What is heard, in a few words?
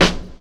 tight low end acoustic snare f key; snare-drum-samples